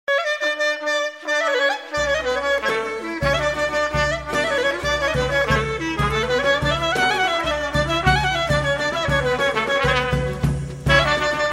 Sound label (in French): Pusteno, Macedoine